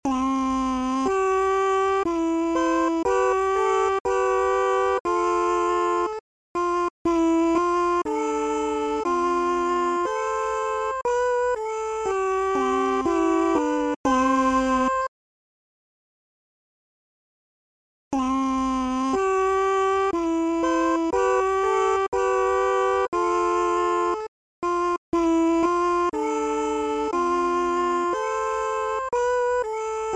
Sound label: Vox; Mogwai Song 2; Gremlins Gizmo; wii